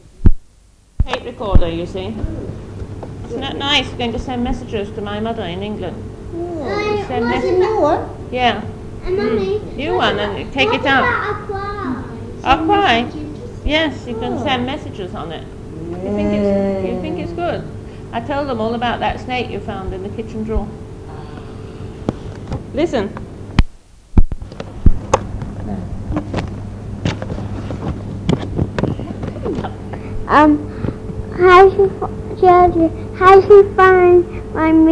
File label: singing